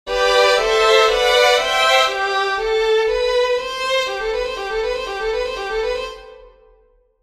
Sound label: Legato Test Violin